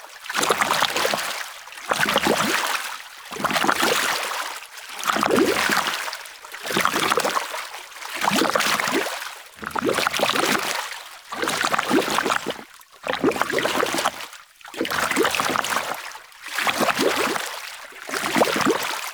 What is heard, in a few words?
Cook Stir Pot; Quests; Veilbreaker; Kahl Camp